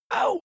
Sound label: male hit soft; menschen; schreie